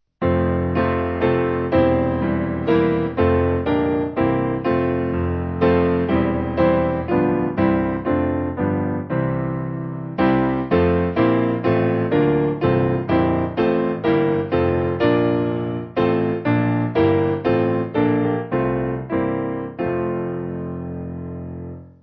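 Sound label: Piano, backing; City Of God; Richmond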